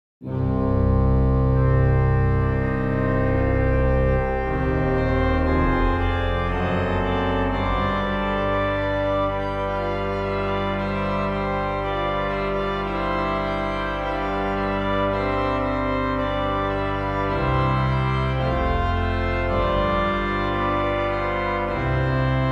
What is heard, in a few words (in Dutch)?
trompet